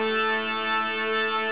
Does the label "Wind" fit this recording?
No